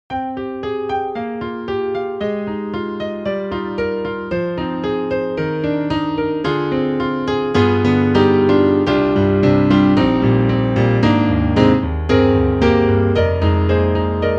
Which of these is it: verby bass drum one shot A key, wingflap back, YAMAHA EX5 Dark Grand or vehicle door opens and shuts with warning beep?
YAMAHA EX5 Dark Grand